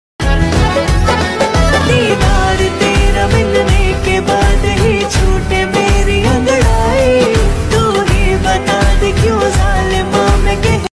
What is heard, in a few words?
female version, hindi ringtone